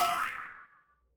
cartoon boing

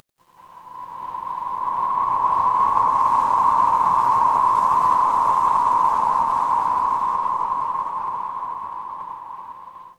horror wind whisper

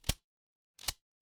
mechanical reload

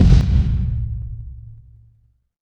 transition impact hit

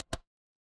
ui toggle

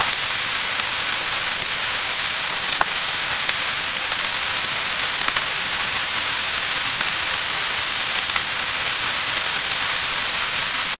ambience rain

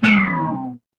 feedback fail

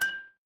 ui chime

